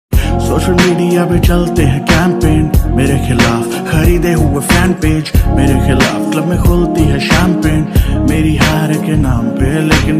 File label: hindi rap ringtone